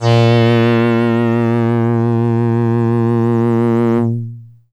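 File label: Box Cello DX100 A sharp 2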